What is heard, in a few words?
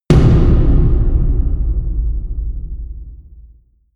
huge tom hits